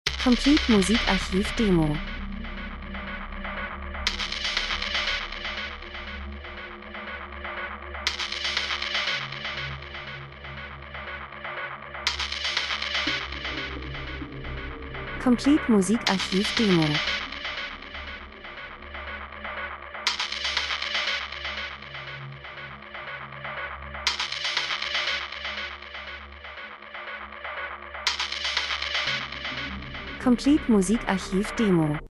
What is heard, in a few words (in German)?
drums, rhythmus; Gefahr Spannung Angst Krimi Horror kalt dunkel Urban TV Spielfilm Sequenz Synthesizer